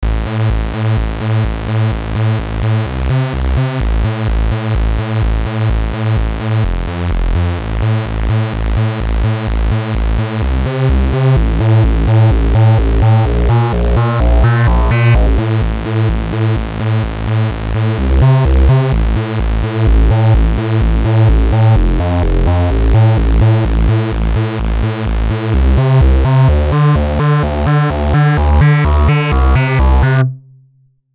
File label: Bassliner; Arturia Buchla Easel V